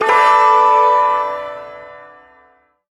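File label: Shawzin Major Chord; Maj Chord L; Warframe; Duviri Ersatz Shawzin; Chords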